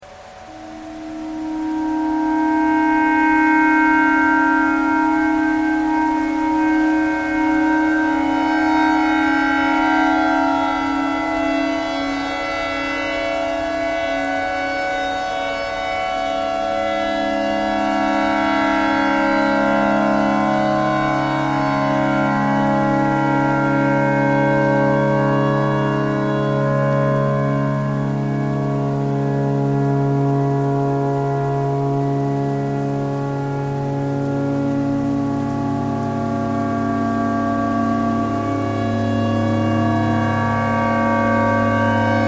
clarinet tornado siren pentatonic all

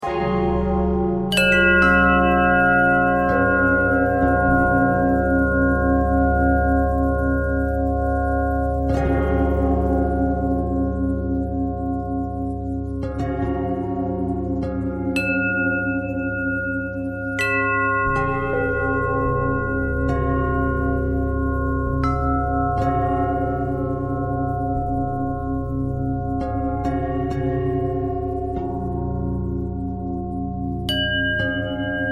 bell bell thread simple, bells

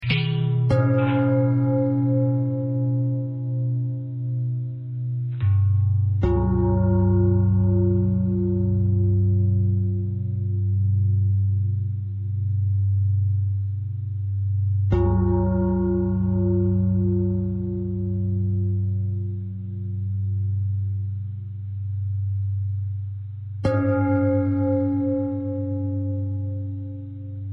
bell long bell thread simple, bells

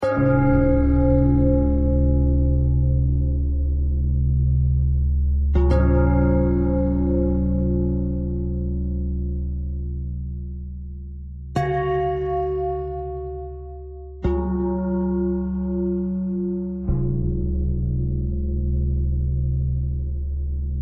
bell thread simple

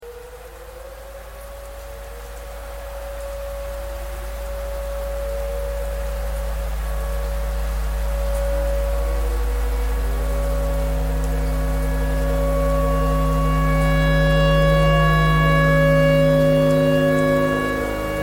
tornado siren clarinet simple

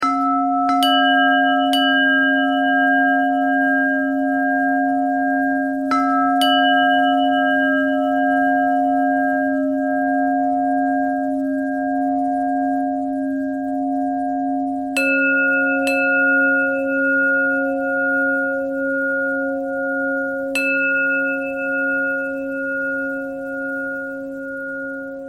bell thread pentatonic

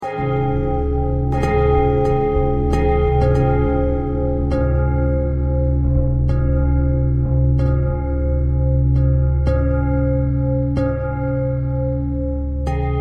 bell bell thread simple, bells

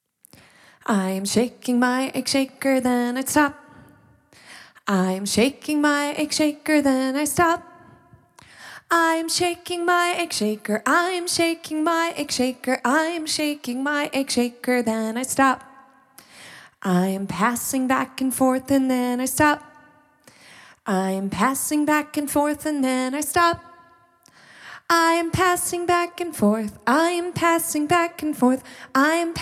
egg shaker

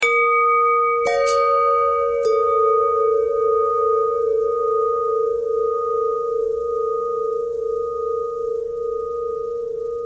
longbell bell bendthread

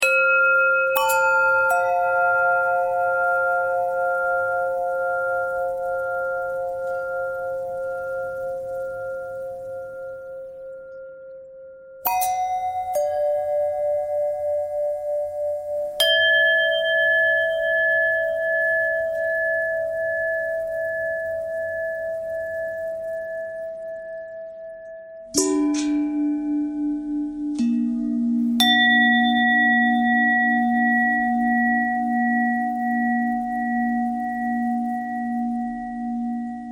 long bell bell thread noise; bells

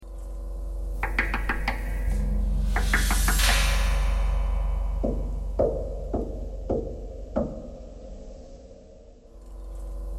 knocking thread noise

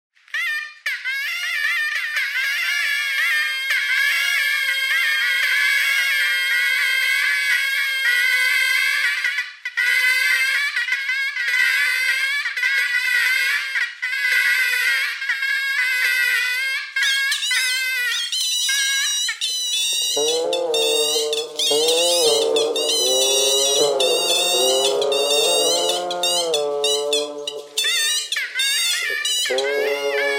bagpipe radio bagpipe radio thread noise

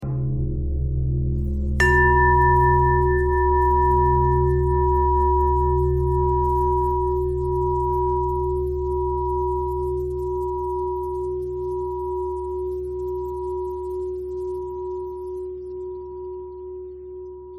bell thread pentatonic

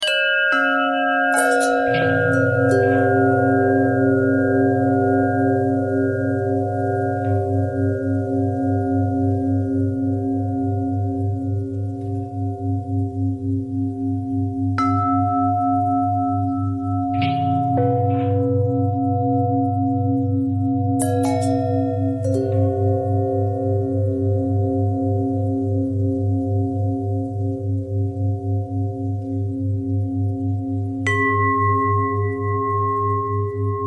long bell bell thread simple, bells

